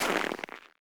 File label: Personnel Footsteps Snow; DCS